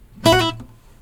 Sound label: ag alert, acoustic guitar fx